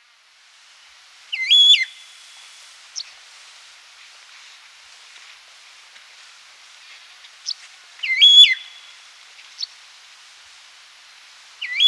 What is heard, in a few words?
Starling; BirdCalls